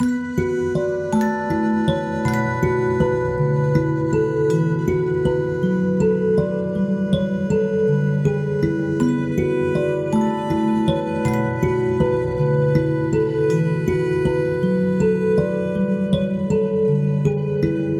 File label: mbira; ゆめ2っき